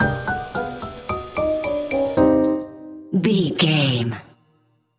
too clever stinger, silly, circus, goofy, comical; innocent; naive; child like; childish; Sitcom; Dramedy

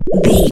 retro teleport warp effect

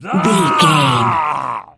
voice male battle shout long

